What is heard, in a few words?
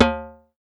Percussion